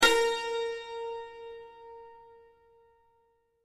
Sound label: santor si